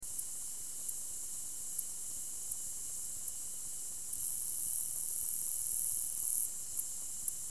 Conocephalus brevipennis; Arthropoda

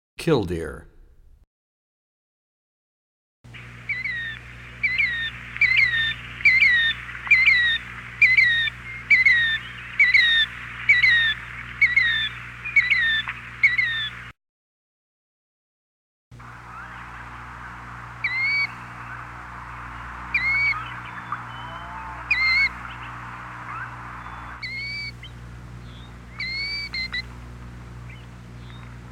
Killdeer; Animals; Birds; 101BirdSongs

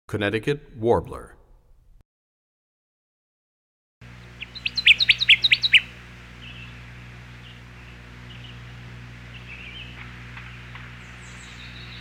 Connecticut Warbler; Animals; Birds; 101BirdSongs

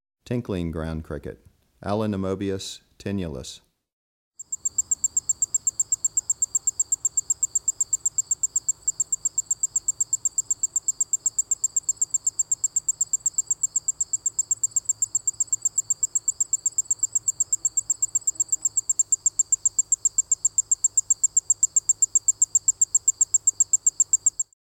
Tinkling Ground Cricket, Allonemobius tinnulus; Crickets and Katydids

